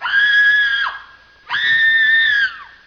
Scream Of Fright

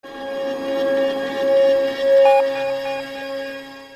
Bip Echo

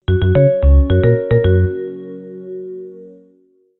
Avaya Cheerful

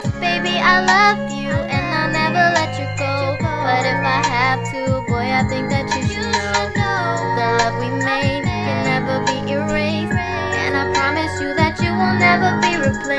Baby

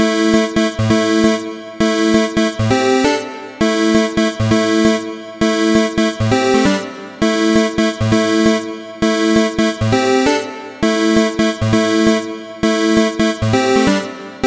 Electro

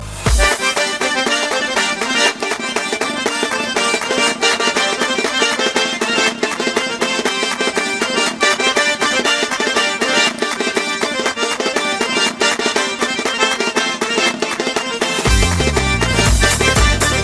Lezginka

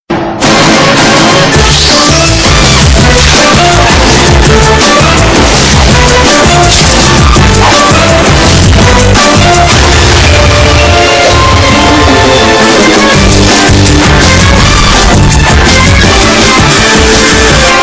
Full Bass; Voda 3g

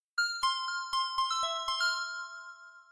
Bell; Aperture

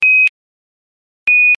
Quindar Nasa Apollo

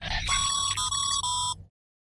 ring

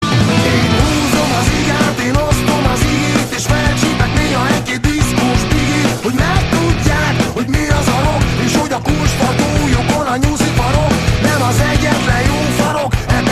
rock

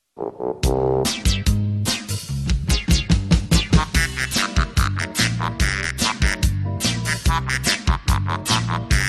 Chicken music

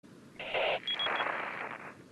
radio; RE4

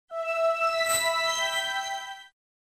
mystic melody; SA2B

